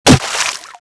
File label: slosh; svencoop